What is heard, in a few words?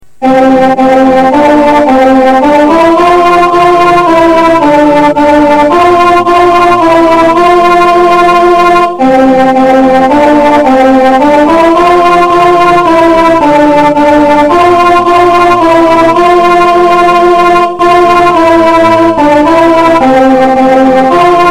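o little alto; Christmas; carol tracks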